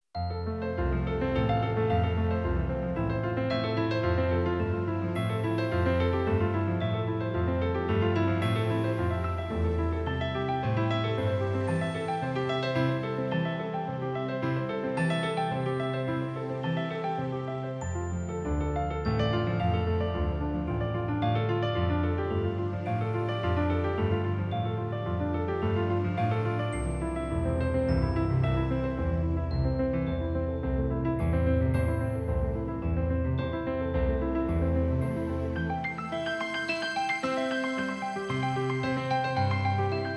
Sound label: Piano key; dvdlab